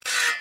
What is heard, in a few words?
Blade Cut